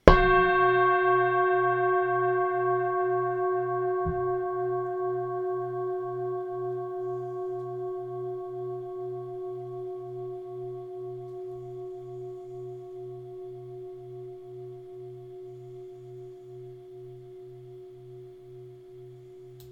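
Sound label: Flower of life G 275 cm, singing bowl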